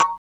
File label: high chink; sgi